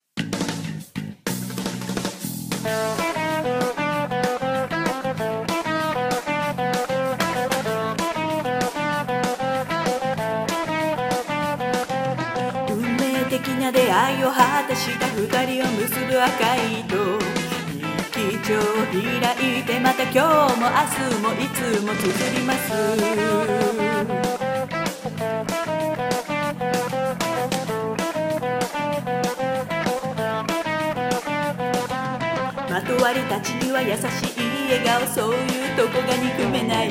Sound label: band arrange